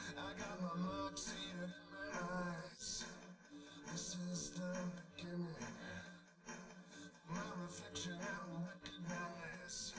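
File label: with drums live rec 250 ms 1 m; SiSEC10; underdetermined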